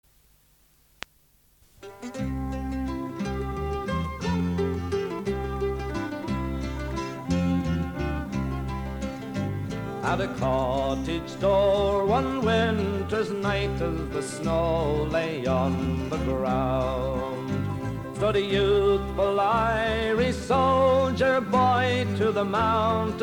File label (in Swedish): irlandsk musik